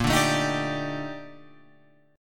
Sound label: A sharp Major 13th A sharp Guitar Open F; A#M13; strummed; JGuitar